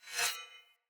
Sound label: Dagger Whoosh Accent; Weapons; Swords